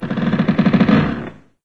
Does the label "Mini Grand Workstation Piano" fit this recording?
No